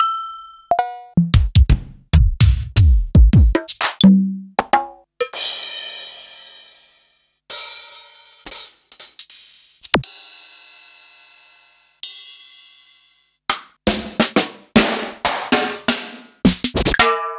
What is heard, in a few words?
Yamaha SY85 all